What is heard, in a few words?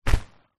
household umbrella close grab hand